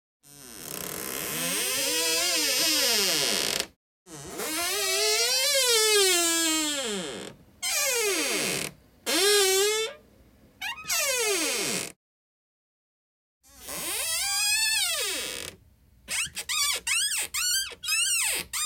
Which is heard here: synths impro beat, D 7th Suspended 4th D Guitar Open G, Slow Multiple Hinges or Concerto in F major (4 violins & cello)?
Slow Multiple Hinges